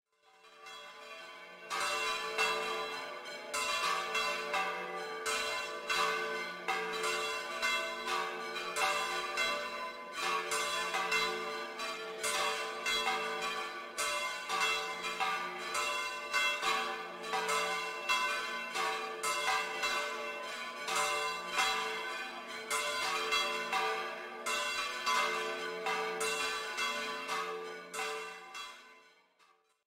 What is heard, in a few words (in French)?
cloche paques